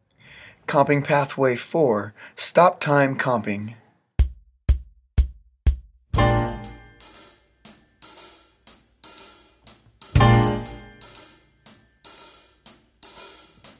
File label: comping stop time